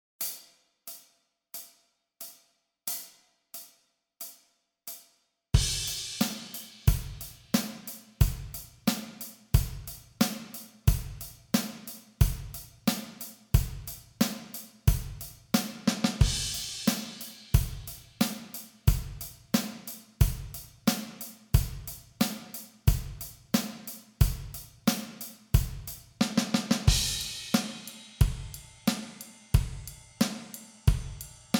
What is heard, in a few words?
090 bpm, practice tracks